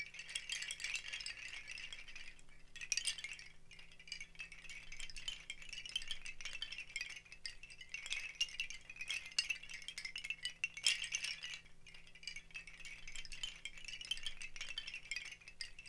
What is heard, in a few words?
BAMBOO, Percussion